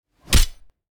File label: Knife Stabbing; soundscrate